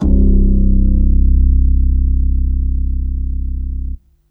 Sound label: Basses C; Vengeance Samples